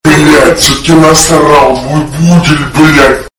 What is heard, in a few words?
fart; pvkii